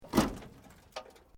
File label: Metal Light Movement Minor